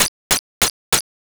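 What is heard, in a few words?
footsteps wet road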